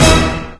orchestra hit c5; Tank

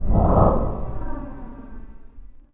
spaceship surrender; Sid Meier's Civilization 4 Complete; Beyond the Sword; Final Frontier; Units

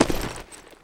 tile, footsteps; Counter-Strike Global Offensive

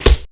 arrow hit body; Mount&Blade Warband

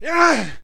man ugh; Mount&Blade Warband

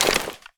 pistol helping hand extend

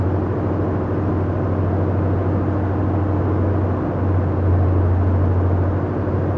distance airplane medium idle; Hearts of Iron IV Death or Dishonor

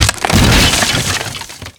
smash cave wood rock collapse; physics; destruction; Counter-Strike Global Offensive

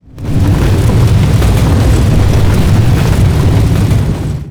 downhill; Left 4 Dead 2

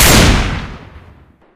shotgun fire; weapons; auto shotgun spas; Left 4 Dead 2

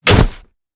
metal hit high armor low damage; Mount&Blade Warband

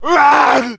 man hit; Mount&Blade Warband

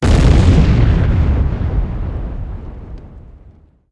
explosion; Alawar; Crusaders Of Space 2

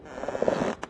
creak, strider; Garry's Mod; hl2

